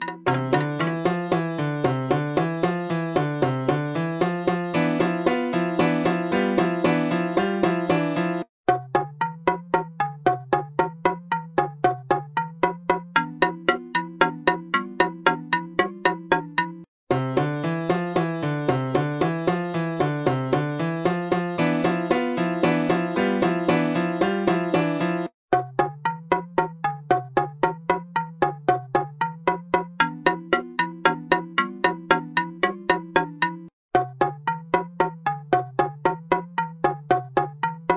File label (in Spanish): Intriga piano, Piano y Marimba; música de fondo; Intriga Vol.1